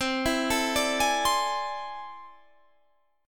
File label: A Minor Major 11th